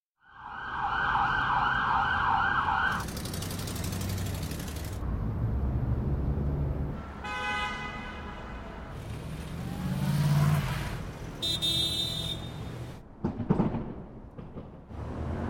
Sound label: 3D Surround Ambiences Urban USA; BOOM Library